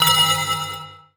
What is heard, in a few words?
Pickup Magic Bells; Pickups